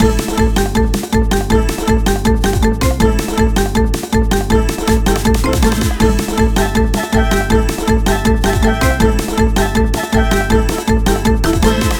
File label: Bgm bonustime jungle night